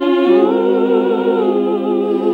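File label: Classical Sing, choir; Best Service Gigapack 1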